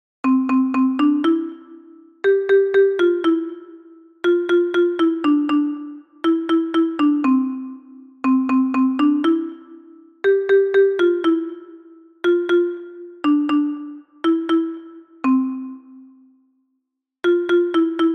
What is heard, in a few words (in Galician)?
Xilofono Contralto